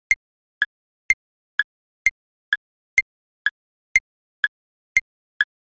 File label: timecount